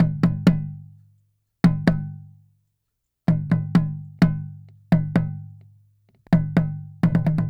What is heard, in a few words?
Small Taiko, Asia; Sonic Foundry (Sony Creative Software); Continental Drift Aftershocks